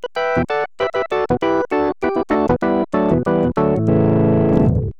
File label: tube model